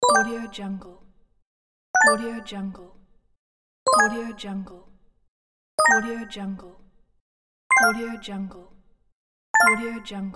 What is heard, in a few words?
pick up item